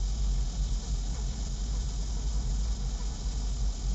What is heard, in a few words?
Ambience Loop, outdoor